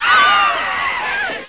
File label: Crowd Chatter; PC; MARIOSFUNDAMENTALS